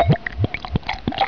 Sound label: pour